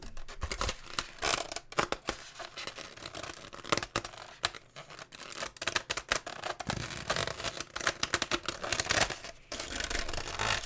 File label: Cracking